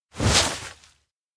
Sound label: pull arm; awayuki; anemoi